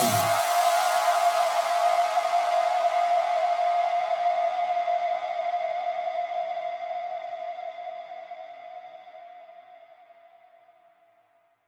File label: 132BPM Downsweep; G#major; Sound FX